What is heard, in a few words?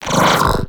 Pull Cast; Warframe; Mag